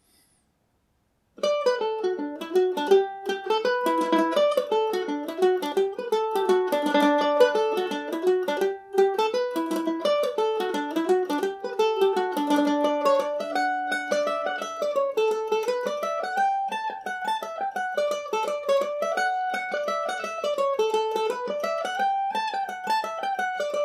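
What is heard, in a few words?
D major, irish mandolin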